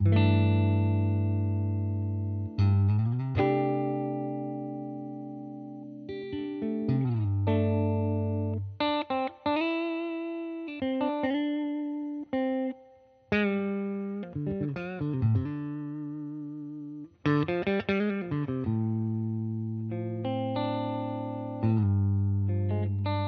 Clean Neck; Apogée kit micros HepCat PAF position manche

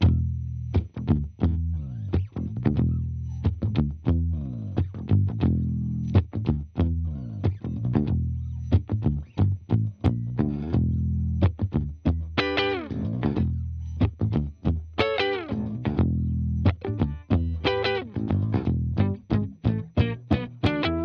Clean

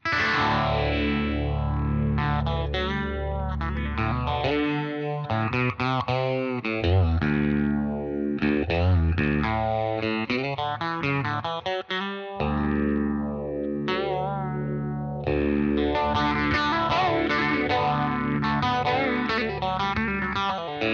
Crunch Bridge, guitares; Égérie kit micros HepCat Broadcaster position chevalet crunch

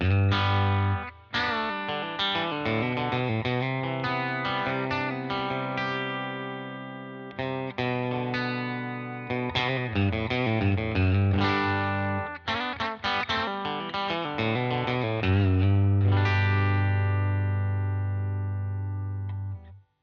Clean, guitares; La Feline

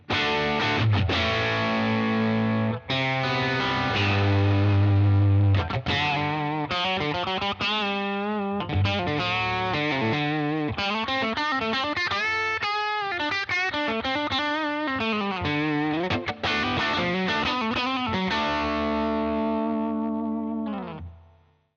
Crunch ByPass On, guitares; La Feline

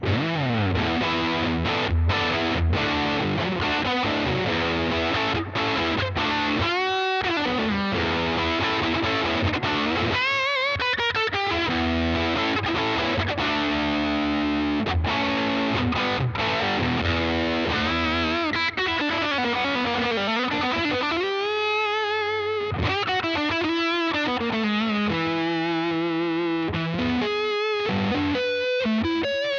Sat Bridge Neck; Apogée kit micros HepCat PAF position manche et chevalet « saturé »

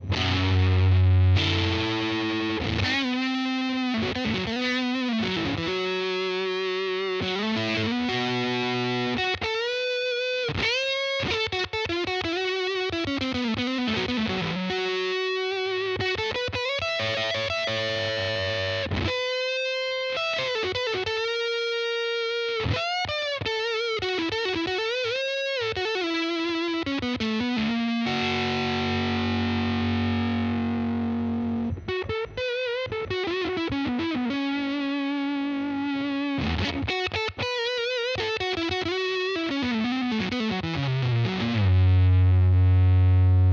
Fuzz Bridge, guitares; Muse kit micros HepCat série L configuration câblage Memphis position chevalet Fuzz

